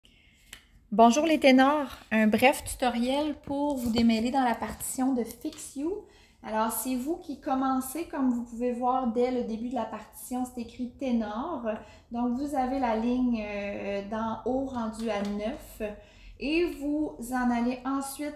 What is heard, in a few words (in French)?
Tutoriel partition Tenor